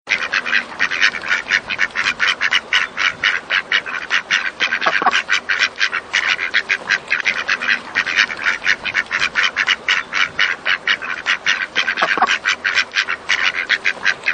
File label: Duck Noise